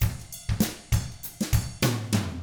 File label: FUNKY, Drums